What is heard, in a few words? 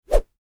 swish